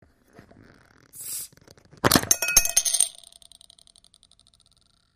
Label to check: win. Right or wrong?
Wrong